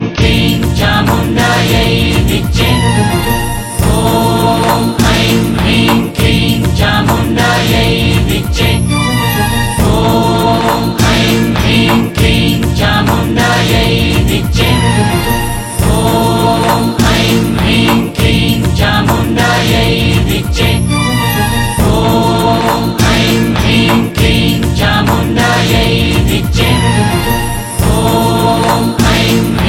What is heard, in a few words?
Shakti Beej Mantra